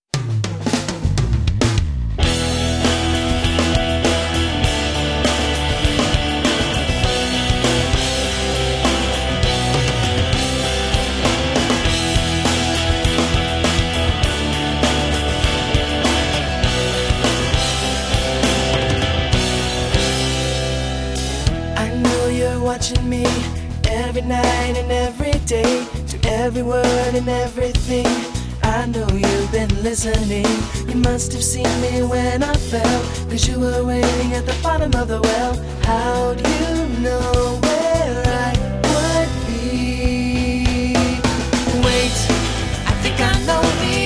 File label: Choir Of Two